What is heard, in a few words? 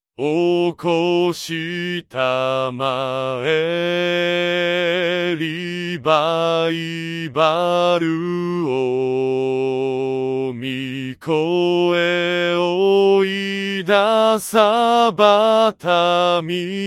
vcl bass; easter